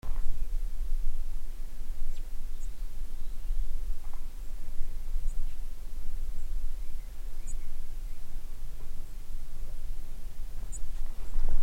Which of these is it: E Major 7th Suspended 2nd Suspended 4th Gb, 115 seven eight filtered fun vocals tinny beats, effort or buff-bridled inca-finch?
buff-bridled inca-finch